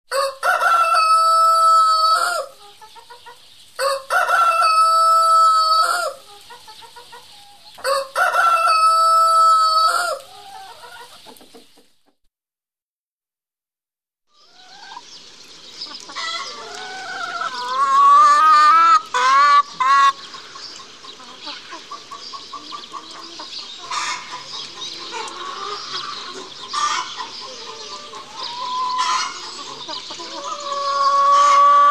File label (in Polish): efekty dźwiękowe zwierzęta domowe